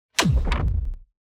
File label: building destruction low